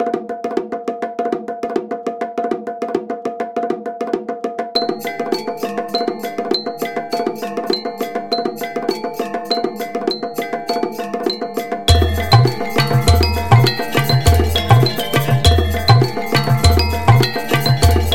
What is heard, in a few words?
Gamelan